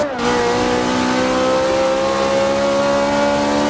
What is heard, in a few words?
third, ferrari 458; vehicles